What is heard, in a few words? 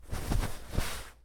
cloth bed push away; anemoi